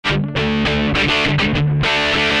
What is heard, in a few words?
riff